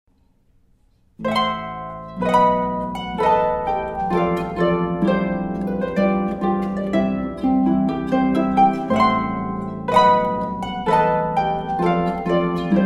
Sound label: Harpist; Houston; Arabesque